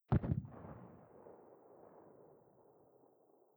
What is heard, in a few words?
shotgun silent